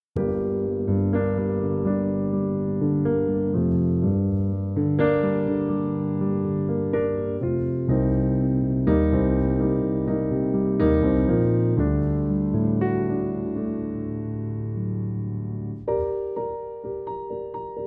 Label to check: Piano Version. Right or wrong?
Right